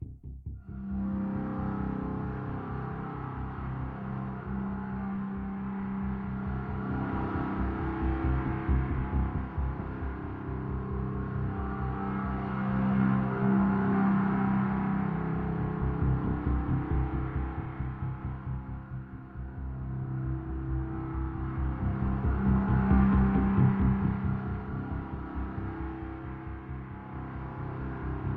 Amb Tension; Hunted Ch0

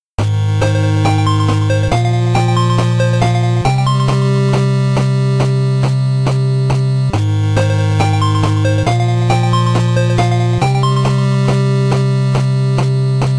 BGM MENU INTRO 8bit; Mighty No. 9; 8-bit soundtrack

